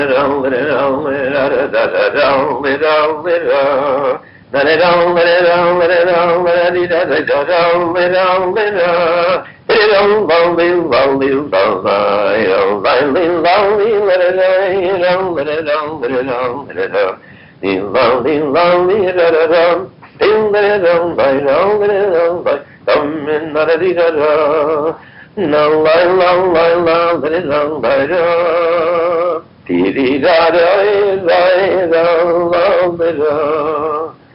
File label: niggun